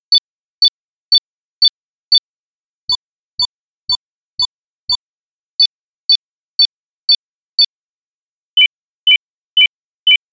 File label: Bomb Timer Countdown Blips; Hollywood